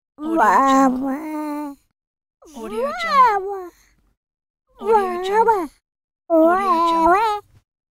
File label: orchestral baby